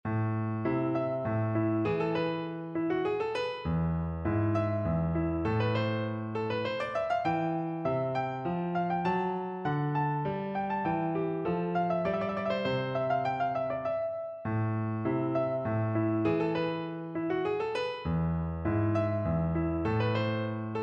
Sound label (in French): Sonate en La mineur Gaillard clic, tuba